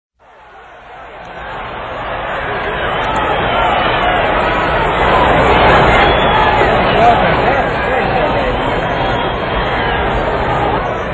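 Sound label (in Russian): bolelshiki na stadione, fans at a stadium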